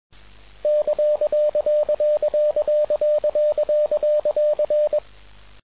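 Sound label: Iambic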